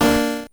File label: multiplier A#4 D5